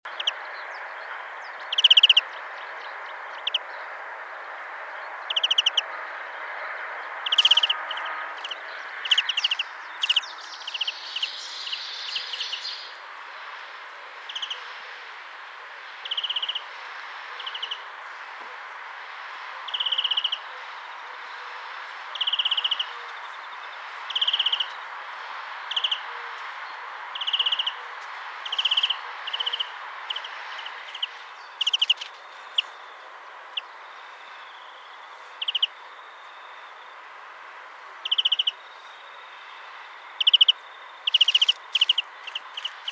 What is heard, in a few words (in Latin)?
Chloris chloris; SETE